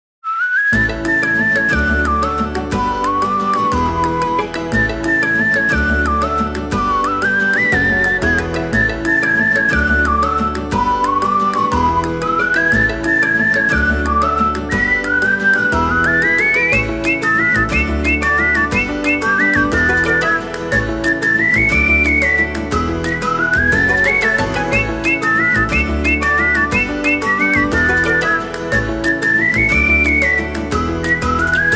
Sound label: jig whistle